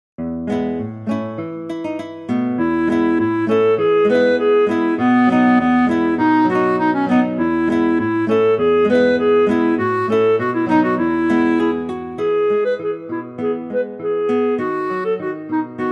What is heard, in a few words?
clarinet, folksong; bobby shaftoe